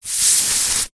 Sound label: balloon air release valve hiss; foley